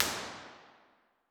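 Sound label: impulse response, nuclear reactor hall; b-format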